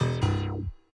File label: error; calamity-cobra-2